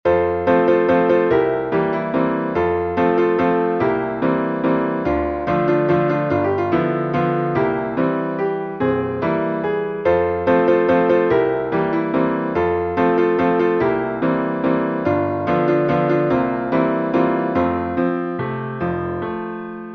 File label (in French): valse